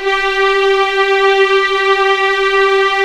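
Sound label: G4 legato forte, violins; East West; The Ultimate String Collection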